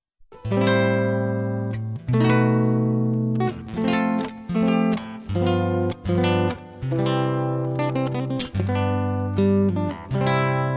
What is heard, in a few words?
Wedding March